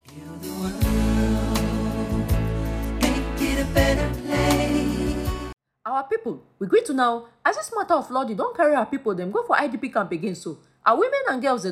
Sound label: JINGLE; GRID PSEA; initiative for gender and development grid nigeria